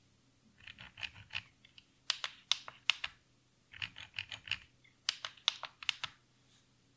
Function 2 Roller Side

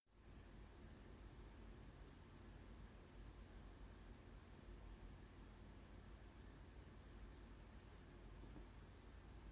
gsync2 audio mod

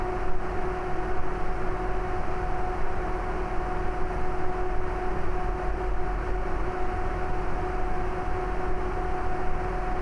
high speed MAX RPM; BQ Light Wings

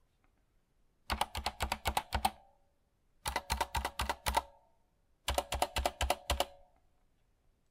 K70 Space Backspace Enter

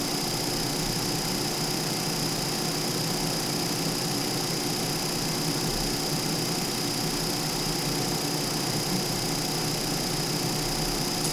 Gaming Noise

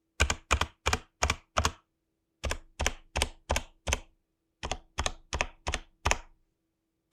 Leer Enter Back

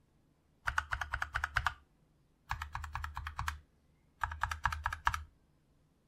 Kail red; SGK50 S4

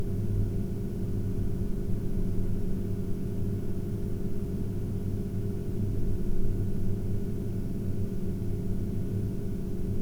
1000 RPM

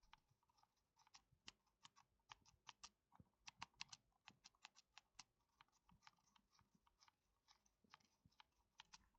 JoyStick

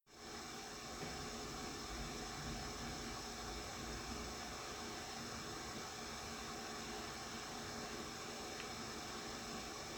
gsync2 audio stock

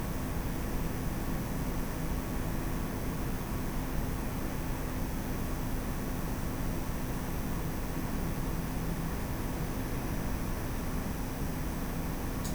Sound Record Gaming

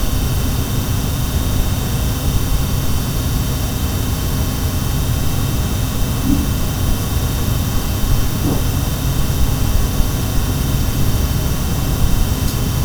Gaming Noise

